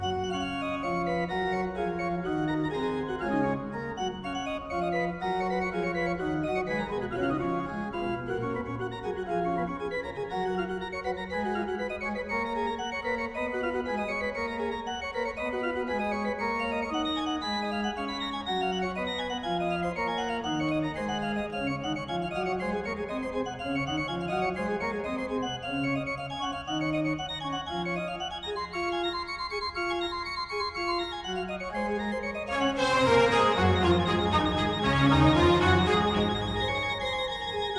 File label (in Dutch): orgelconcerten; Handel; Friedrich Wilhelm Zachow